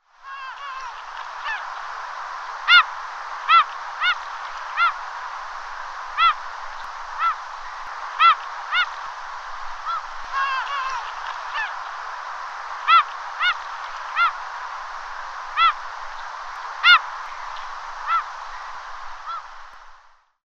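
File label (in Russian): чайка орёт с морем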